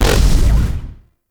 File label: Pod Shotgun Proj Fire